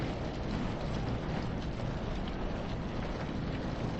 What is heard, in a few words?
ASPHALT MID; SIMUZENSOUND